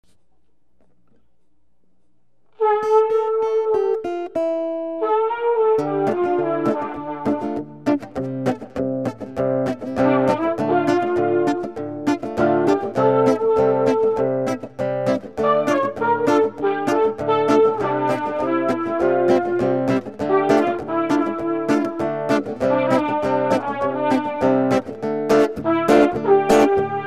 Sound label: air music